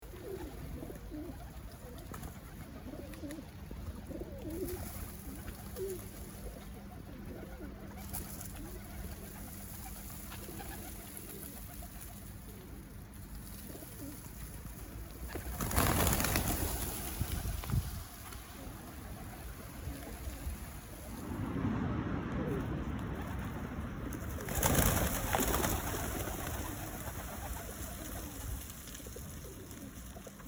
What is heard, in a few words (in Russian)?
gorgolub